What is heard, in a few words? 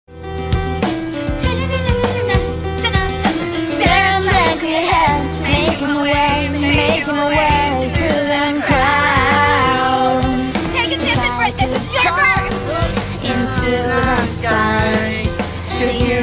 white chicks singing in the car